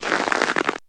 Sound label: ball; snowman; icecream